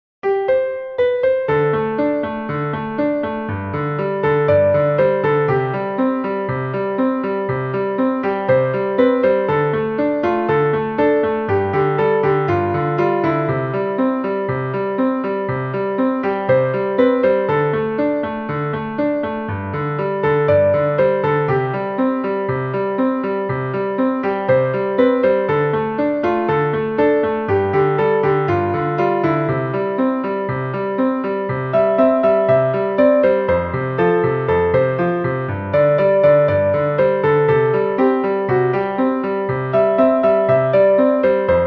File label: Easy Piano